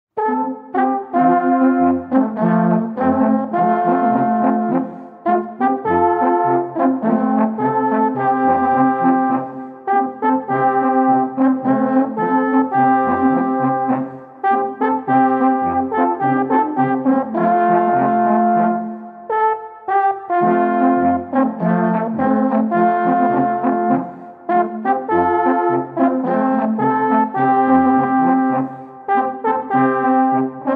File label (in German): Polka, alphorn